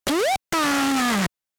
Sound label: Pikachu SFX; RBY